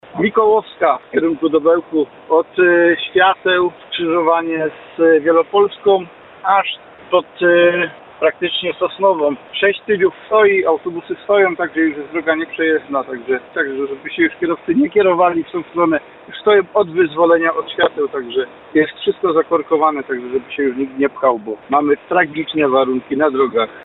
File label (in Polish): zima alarm